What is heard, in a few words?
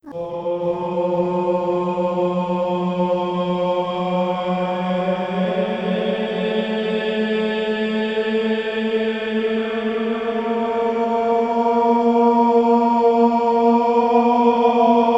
Temple Background; Voidspire Tactics